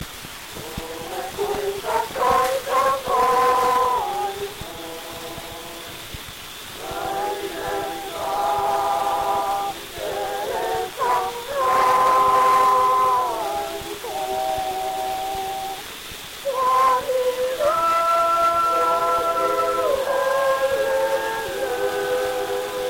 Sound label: Gramophone